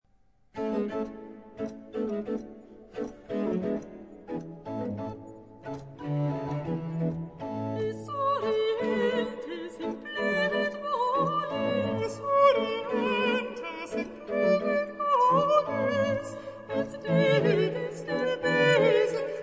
Aria Soprano, Alto; Magnificat, RV610; Esurientes

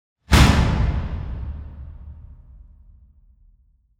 Rooftop Strike; Gothic Storm Music

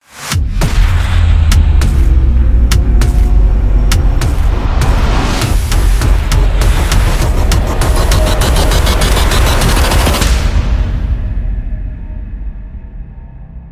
Pummeling; Ninja Tracks

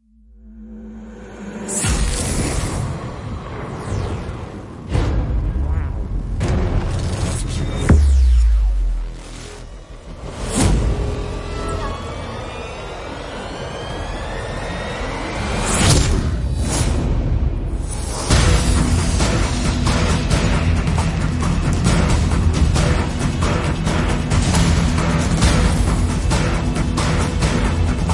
Depth (Short No Choir)

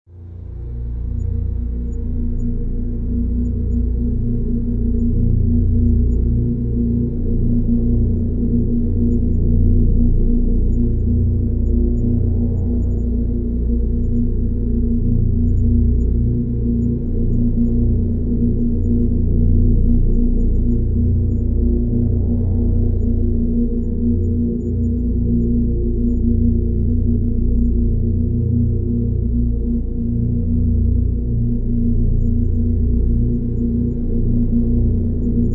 Drn Black Gold (Bb); Brand X Music; Brand X Music Catalogue - Editor's Essentials

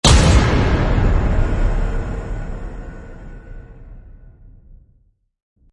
Brutalz Hit Epic; Ninja Tracks

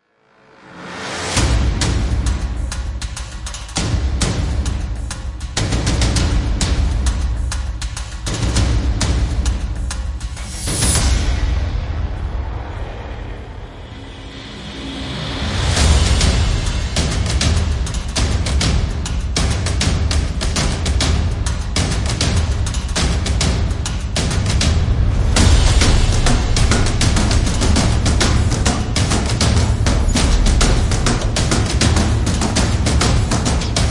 Percussion; Fired Earth Music

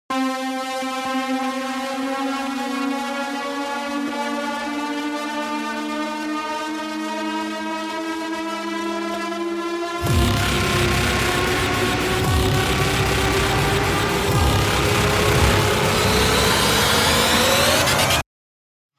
No Perc; Ninja Tracks

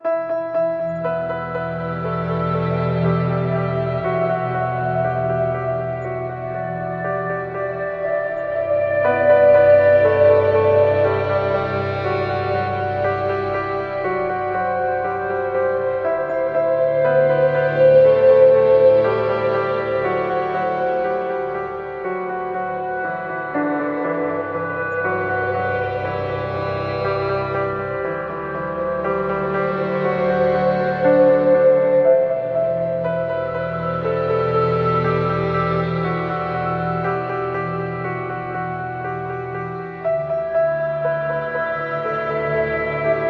Resignation Ambient

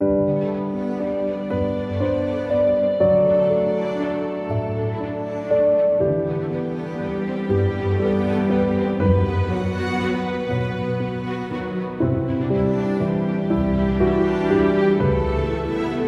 Heartfelt Ambient

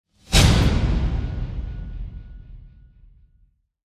Star Whoosh; Gothic Storm Music

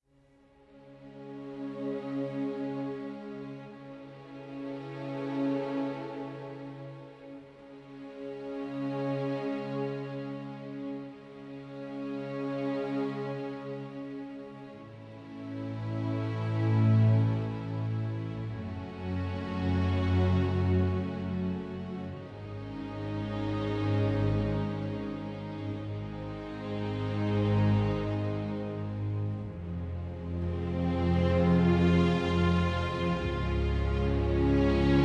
Penance (Orchestral); Fired Earth Music

